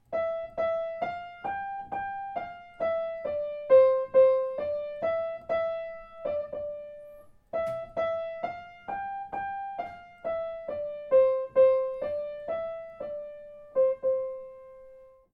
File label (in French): Hymne à la Joie